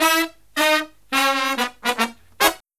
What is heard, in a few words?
Brass Riffs